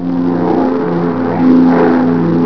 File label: PROP FLY; TRANSPOR